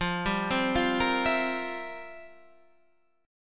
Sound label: F Major 7th Suspended 2nd Suspended 4th, Guitar, Standard; arpeggiated; 13,10,10,10,11,12; JGuitar